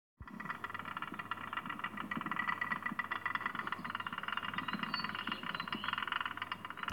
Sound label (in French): Vocal